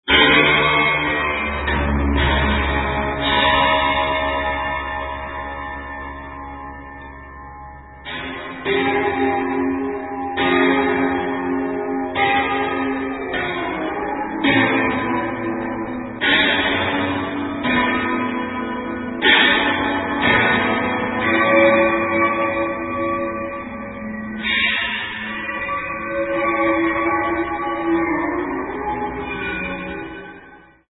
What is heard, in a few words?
nondo